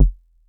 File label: kicks; FutureRetro FR777